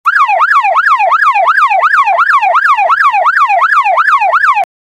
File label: police car chase sirens